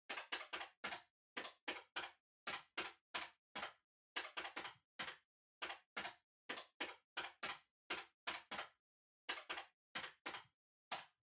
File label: Calculator Far Pattern